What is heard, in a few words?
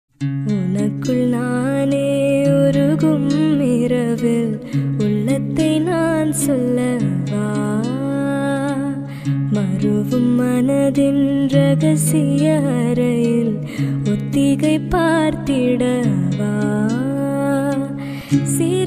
Female Version